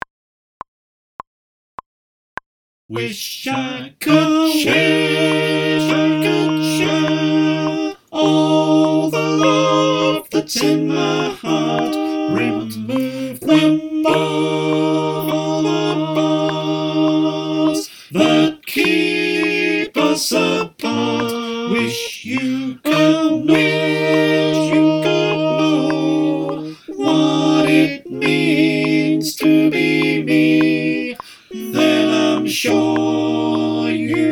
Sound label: SATB clicktrack, choir